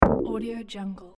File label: industrial ambient exit menu sound